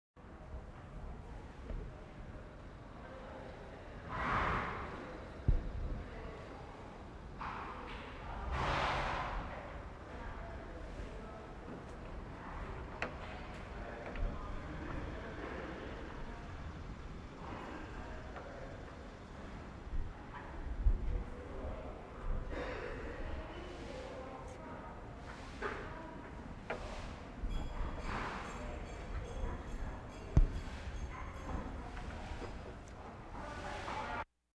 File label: Animal effects snorts grunts farm ambience